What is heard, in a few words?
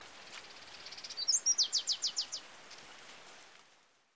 Variable sunbird